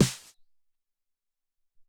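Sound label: Noz Snare 1, Alesis SR-18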